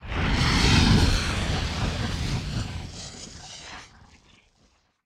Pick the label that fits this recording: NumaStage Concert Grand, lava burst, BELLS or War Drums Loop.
lava burst